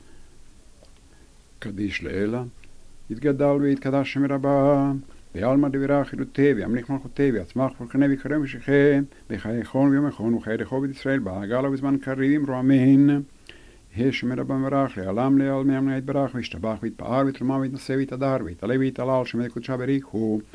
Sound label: Half kaddish